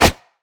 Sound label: great wraith basic attack; LoL; monsters